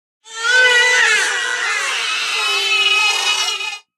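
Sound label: Newborns Int Many Cry, crowd children newborns interior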